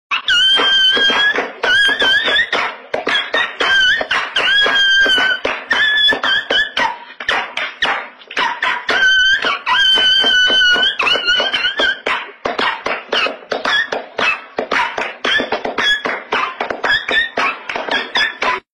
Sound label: Dog Barking Funny Ringtone; ringtones